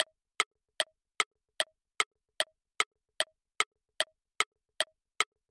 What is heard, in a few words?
quiz timer, anime